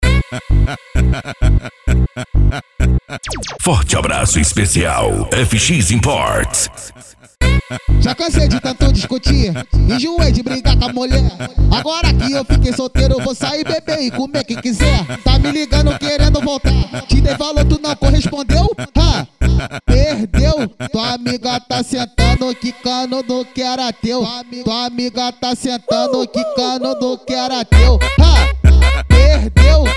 funk mega bass

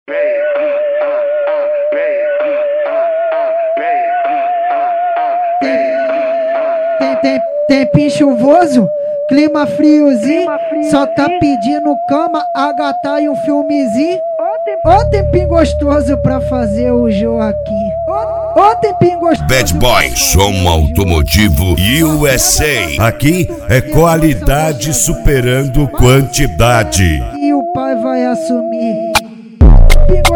especial funk; cd bad boy som automotivo usa

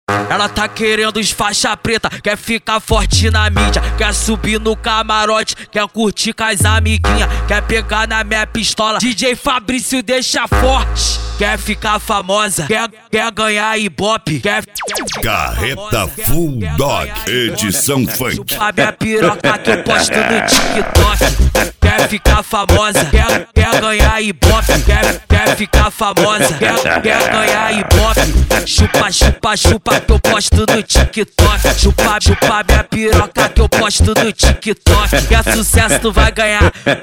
funk

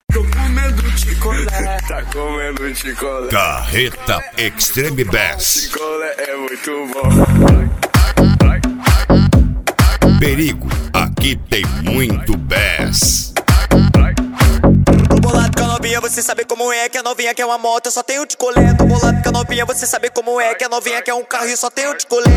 extreme bass; carreta

